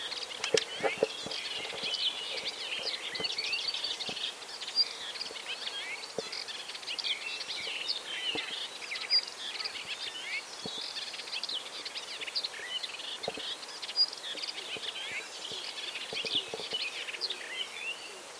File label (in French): hypolais polyglotte